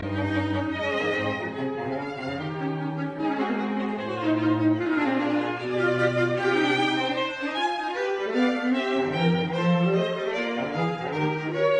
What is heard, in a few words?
String Quartet in F minor Finale Fuga; Haydn; No 23; Op 20 No 5; a due soggetti